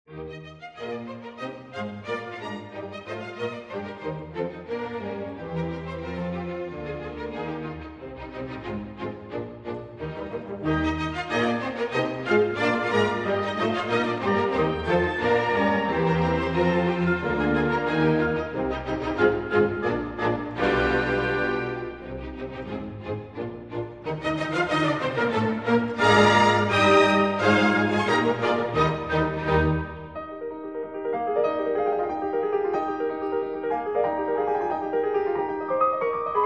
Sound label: Piano Concerto in E-Flat Major, Rondo allegro; Piano Concerto No. 10